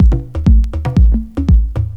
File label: tribal, loops